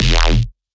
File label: Bass Shot E Thicc, Dubstep; Ghosthack; Advent Calendar 2018